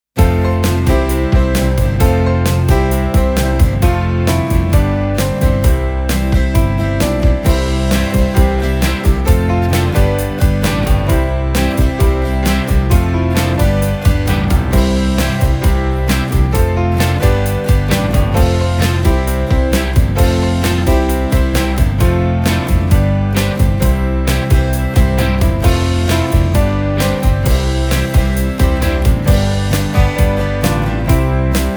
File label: Instrumental; Kids Liturgy; Year A